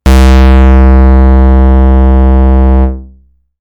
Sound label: Basic Pulse, synthlead